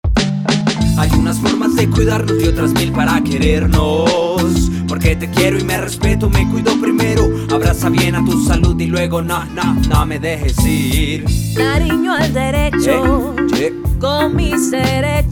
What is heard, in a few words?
soundtrack